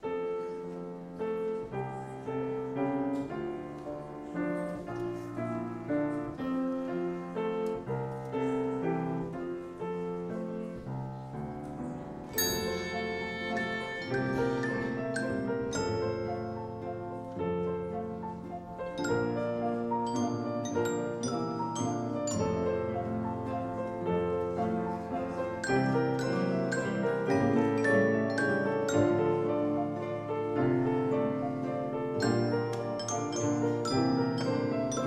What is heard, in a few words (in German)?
Kinderorchester